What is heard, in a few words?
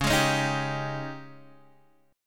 G sharp Major 7th Suspended 4th, C sharp